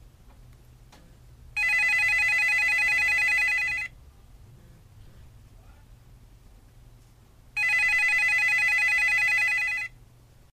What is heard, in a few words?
home phone ringing sound